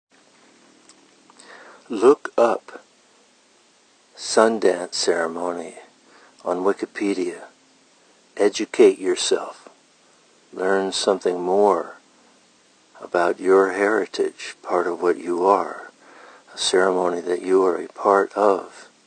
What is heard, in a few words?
sundance ceremony, flutes